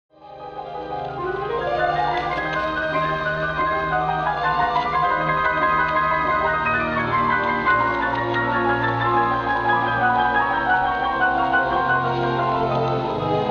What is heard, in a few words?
Piano Concerto Finale Alla breve, Philharmonic Symphony Orchestra; No 3, Op 30